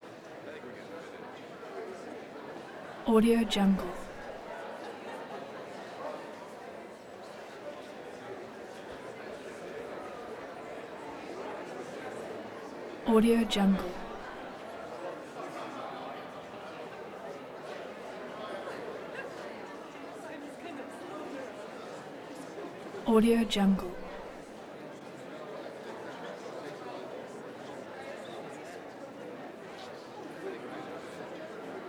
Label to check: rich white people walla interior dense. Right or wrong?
Right